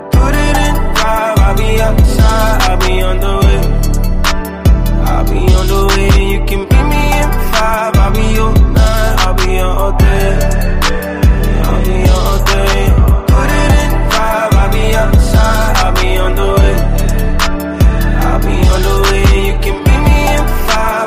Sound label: music; cstrike